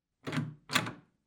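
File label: door handle sound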